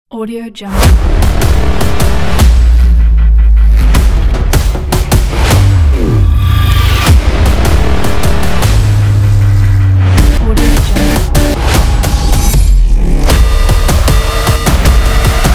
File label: intense hollywood trailer